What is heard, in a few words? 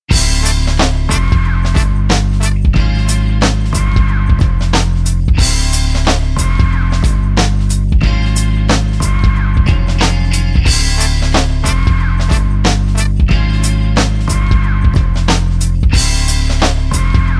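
jazz sounds; zvuki dzhaza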